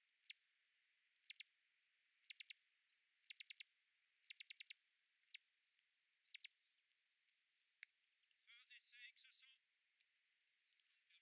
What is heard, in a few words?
DRONES